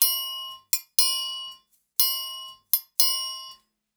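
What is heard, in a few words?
triangle, Percussion Loops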